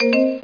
drum

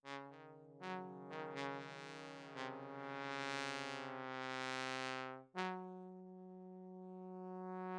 synth; bombogroove 11

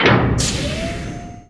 Radar Start Turn; darwinia demo

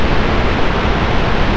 acid amg; duke nukem total meltdown

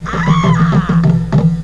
aAa!; Bip Boing & autres

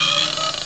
Tubing On

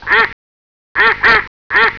DUCK

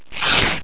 RIP

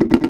Drop In Short; Hamsterball

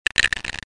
roll

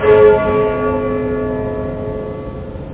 bell; PictureClock

